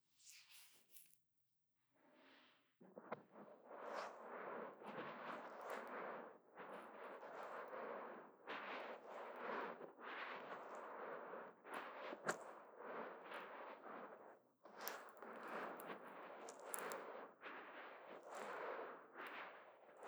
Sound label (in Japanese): 耳マッサージ オイル